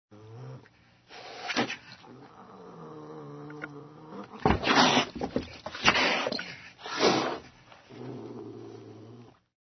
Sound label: ANIMAL CAT